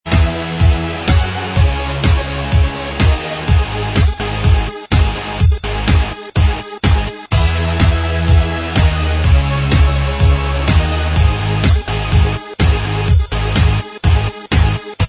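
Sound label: instrumental, backing track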